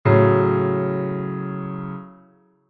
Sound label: F♯m, Chord Inversions